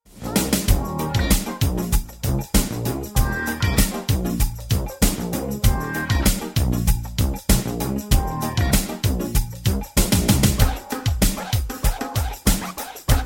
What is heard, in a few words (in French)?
version instrumentale